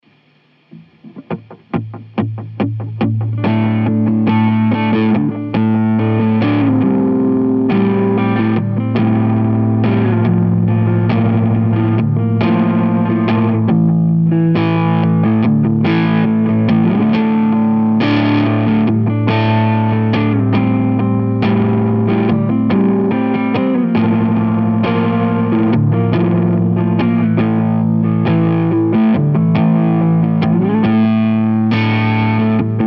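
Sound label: D 140 guitar groove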